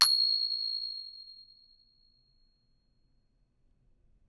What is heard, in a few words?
thai ching open; Thailand